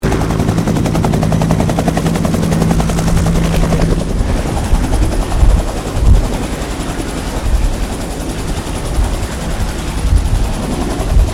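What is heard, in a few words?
helicopter Bell UH1 Iroquois land wind, stereo; RAN UH-1 Iroguios; Helicopters (Sound Effects:Vehicles)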